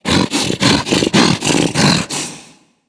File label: tank breathe; cstrike; zombie plague